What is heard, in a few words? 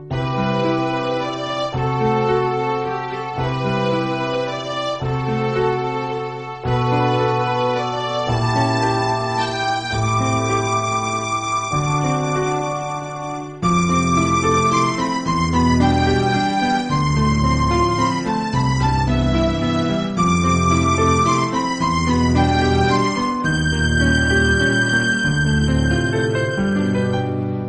lullaby; zelda